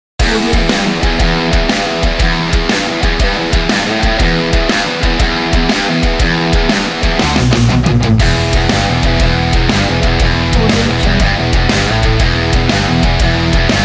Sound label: metal